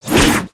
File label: Swing; Little Friend